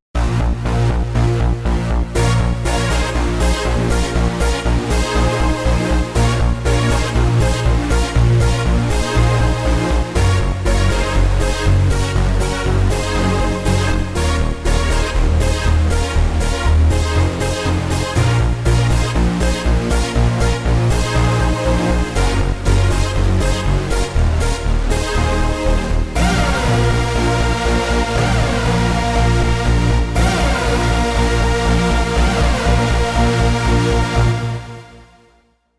saws; Jupiter-80 v2; gg saws

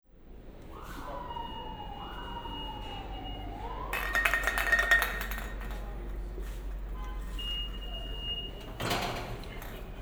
canal st station

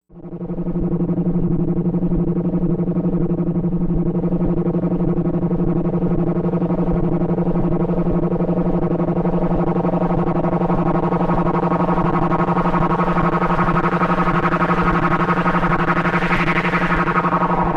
bass synth space bass

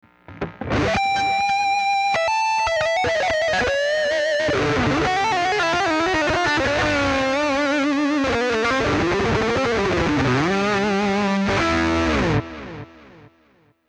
metal delay; cube 60

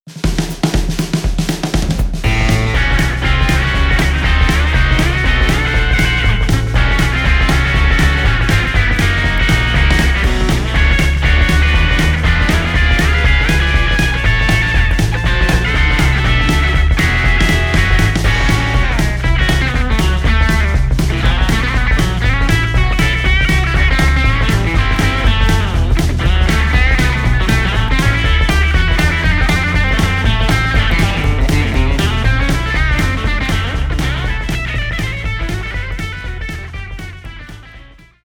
slapback echo

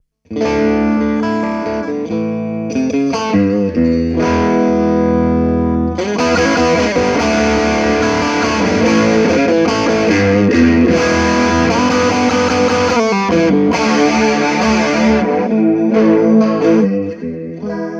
dyna amp efx; cube-80gx

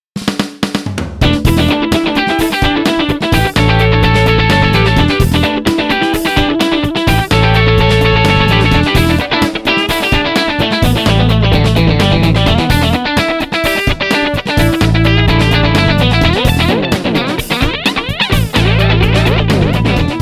patch demo

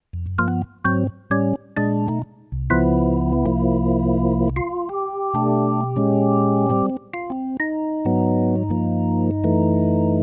organ

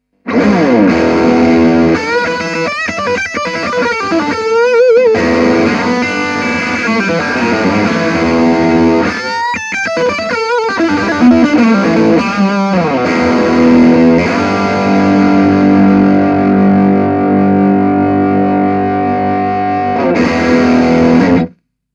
classic stack; cube-80gx

